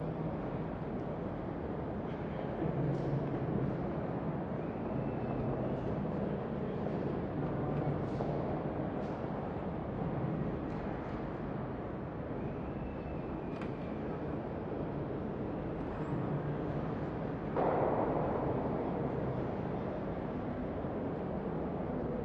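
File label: Ambience Throne Hall; Beholder 2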